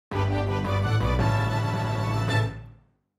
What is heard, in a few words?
Perfect Percussion; Level 3